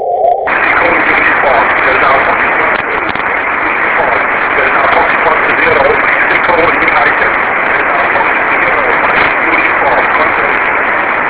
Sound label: ssb 820km